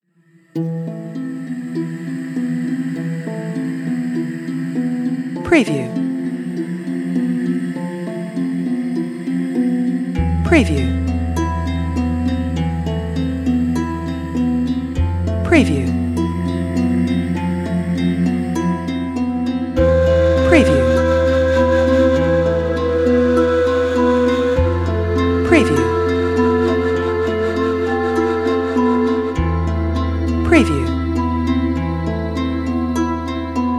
Dark Storytelling Ethnic Islamic Arabic World Music